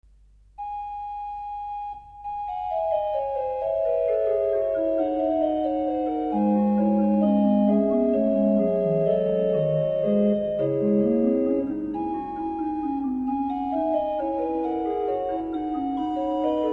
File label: Mass for Organ The Lord's Prayer; Vater unser im Himmelrei